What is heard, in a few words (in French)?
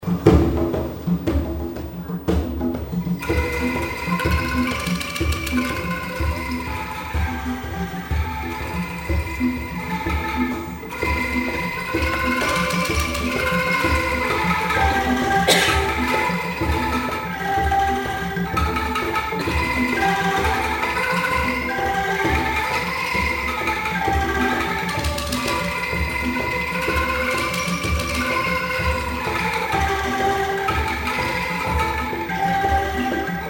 hymne angklung